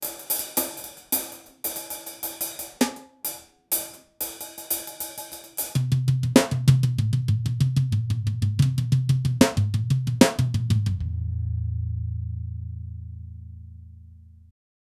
drum jazz sol, funk

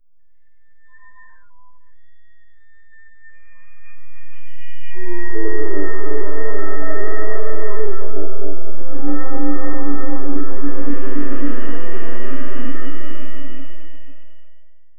suspense and thriller

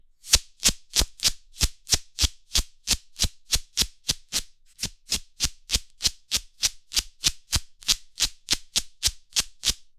rapid rope friction sound

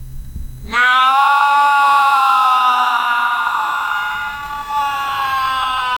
screaming; anguish

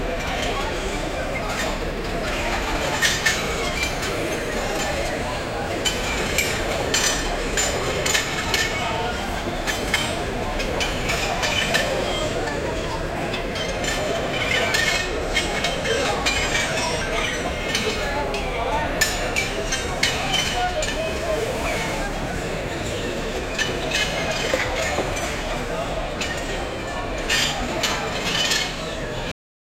large crowd working with, busy

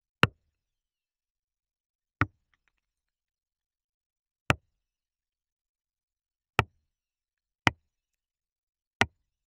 a short realistic sound, golf

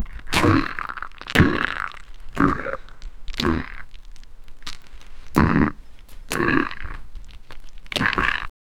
loud cruiseship fog horn, nautical

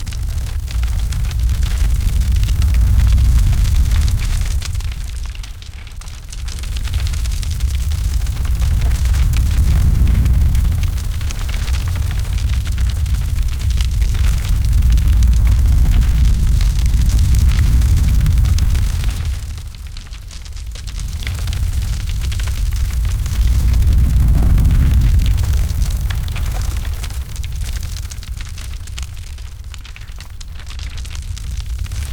bass, avalanche

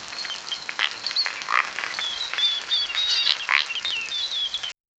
torrential rain pattering, frog croak

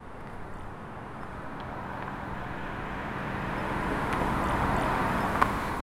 sounds of the evening cit, evening

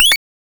the character slides for, 16 bit

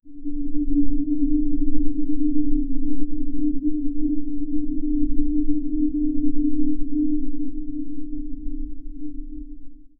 a continuous steady celes, celestial

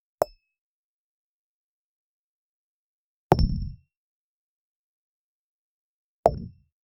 a resistant glass ball th

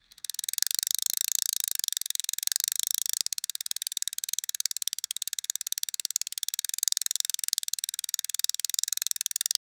very slow thwack, blades

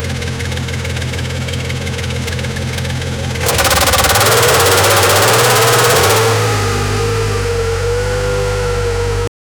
formula one car starting, automotive

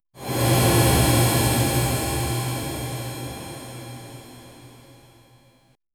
tile reverb ambience

